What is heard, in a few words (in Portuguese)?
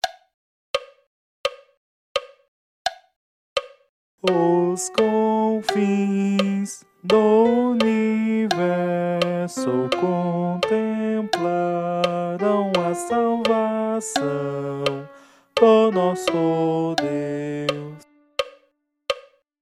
Natal Missa coro